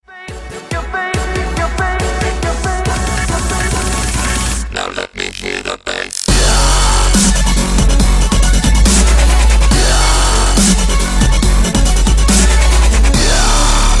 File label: bass drop